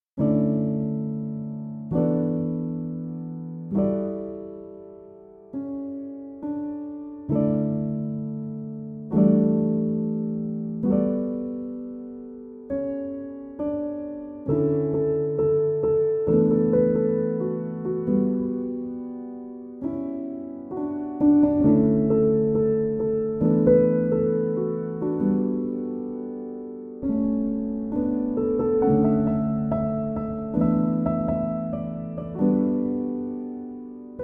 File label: piano instrumental